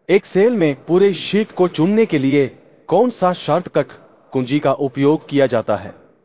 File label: techno